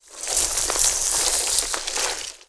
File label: worm strafe, giant worm